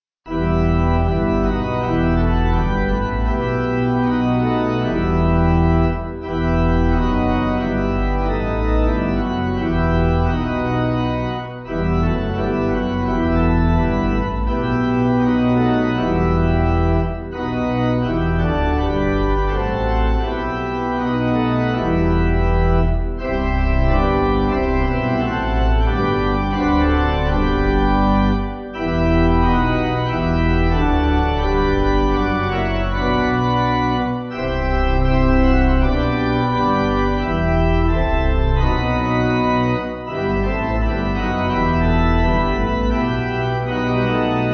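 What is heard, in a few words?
Pipe Organ, Music Backing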